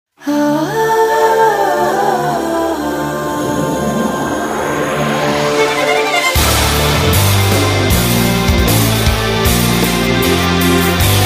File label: Battle BGM